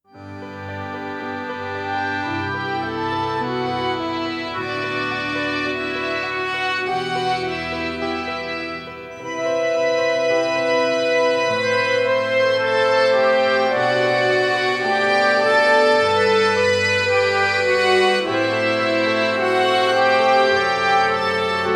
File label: Pavane, accordeon